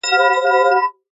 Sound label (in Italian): ciottoli reson; acque